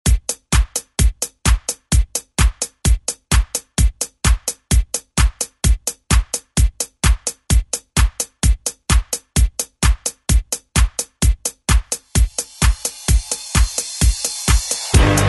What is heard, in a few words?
70s ReDrum